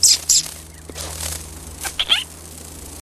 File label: close bird squeaking